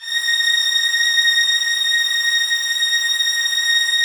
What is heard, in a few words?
A#6, LOUD VIOLINS; Q Up Arts; Denny Jaeger Master Violin Library